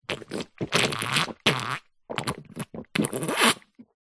human drink guzzle gulp slurp cola from plastic bottle; zapsplat